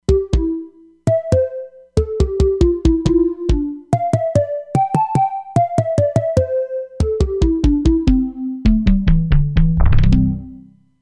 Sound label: Punch Ocarina, E-mu Proteus-3; Factory patches